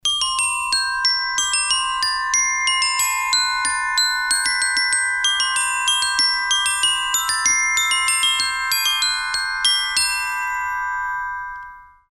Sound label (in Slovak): glockenspiel; Zvonkohra; bicie; Zvonkohra (z nemeckého Glockenspiel) je hudobný nástroj, ktorý zaraďujeme medzi bicie nást